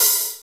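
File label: hi-hat, HR-16; Sound & Vision - Gigapack I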